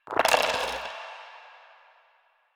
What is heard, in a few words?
Dice Roll Five; Warframe; Koumei